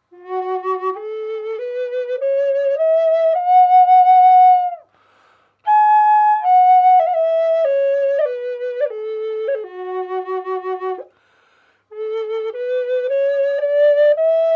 black and white ebony f-sharp dry, flutes

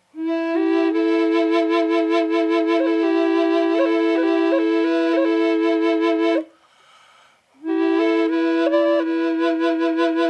Em Birds Eye Inlaid Drone dry, flutes; drones

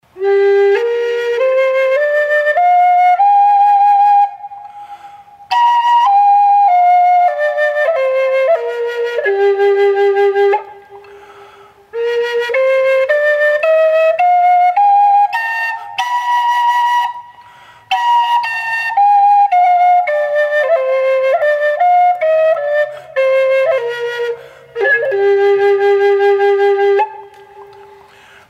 Gm wet, flute

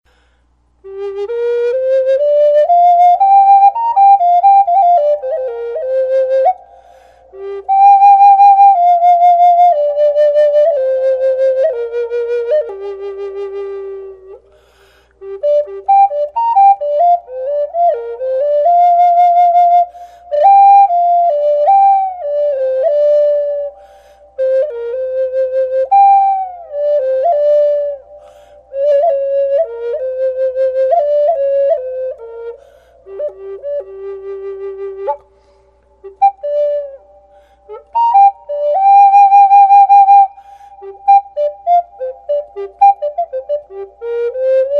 gm flute, western red cedar; standard flutes

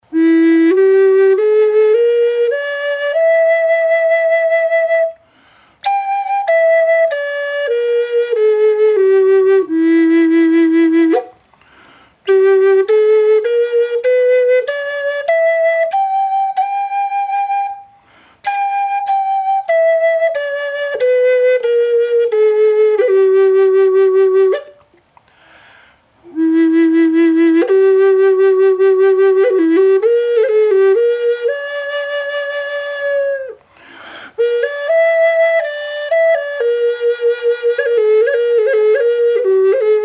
Em dry, flute

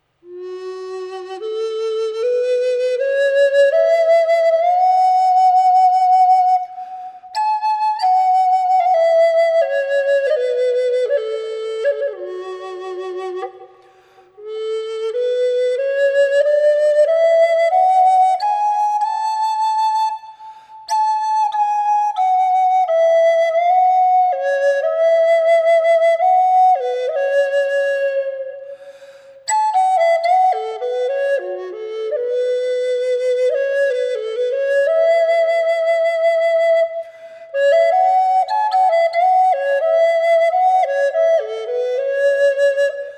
spalted mandarin f sharp wet, flutes

